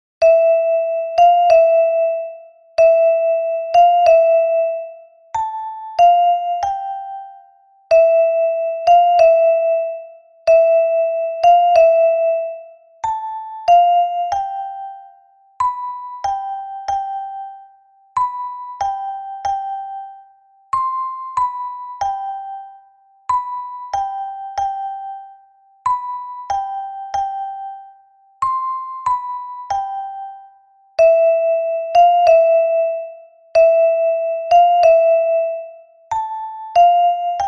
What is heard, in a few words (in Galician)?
Metalofono Soprano; UNHA PEZA PARA CADA FESTA